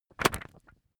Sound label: magazine skim hard